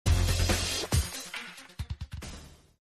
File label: bgm; skateboard